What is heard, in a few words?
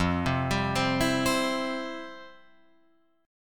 F Major 7th Suspended 2nd, F, down, Guitar, Open F; arpeggiated; JGuitar